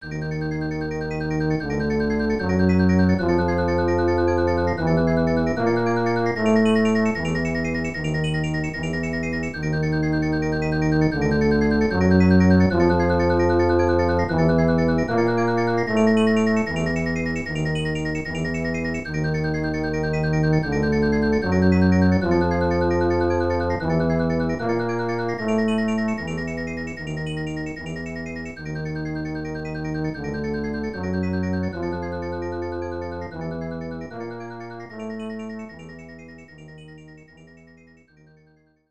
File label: Castle Music; SMAS; Super Mario Bros.,Lost Level